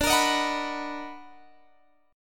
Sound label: B Major 7th D; Bmaj7/D strummed; JGuitar